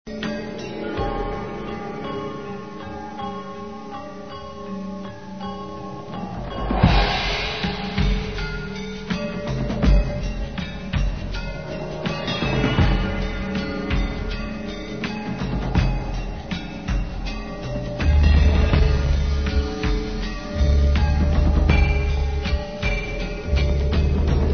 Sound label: Bells